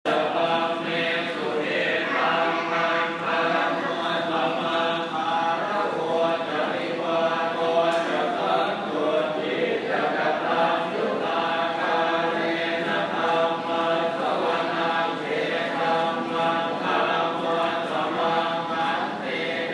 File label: Monks Chanting